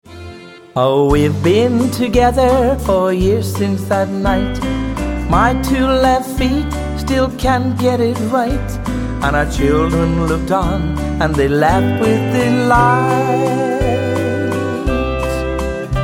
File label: Irish Waltz